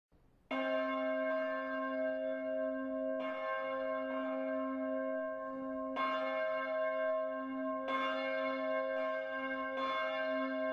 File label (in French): cloche do5; saintes 2014